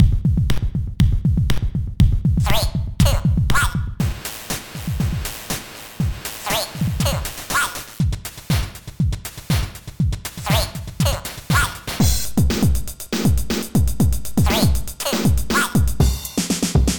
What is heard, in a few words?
rhythm